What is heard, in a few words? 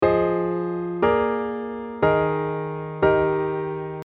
D E sus4